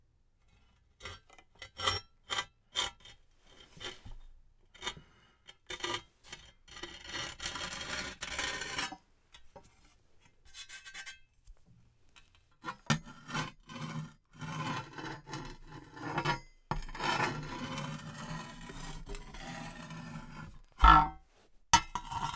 Crowbar Metal Drag On Concrete Scrape And Handling